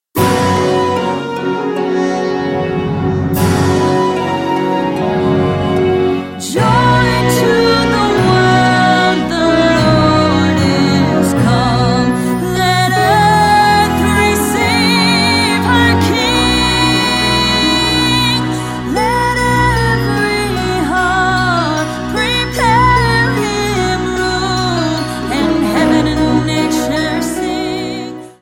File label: Joy to the World, Player Piano